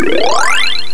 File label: Gamme